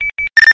Ptt, radio effect

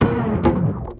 error sound

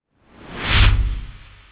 whoosh

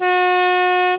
boat horn

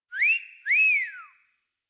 Wolf Whistle, ringtones

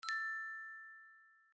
Tada, ringtones

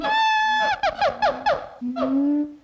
siamang

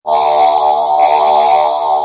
scifi warning

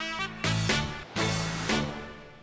teddy short comedy

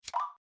Yoink

